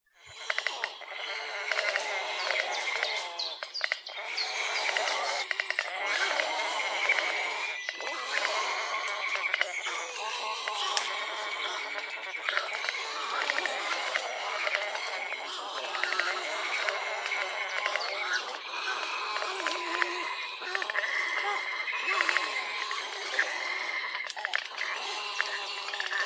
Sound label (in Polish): bocian czarny; birdsounds